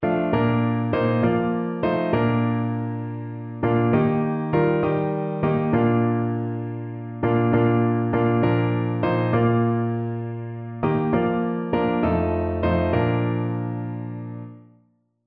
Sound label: cadences